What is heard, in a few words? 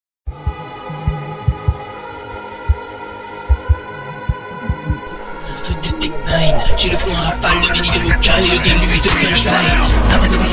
Minigun Vocal